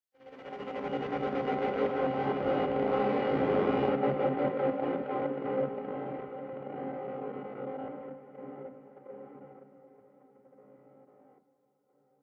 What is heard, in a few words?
Digital-Horror - RISE - Crushed time; Yakumokobe; Digital Horror Full Pack